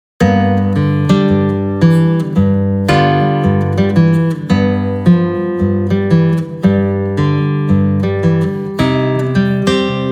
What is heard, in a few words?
Blues In G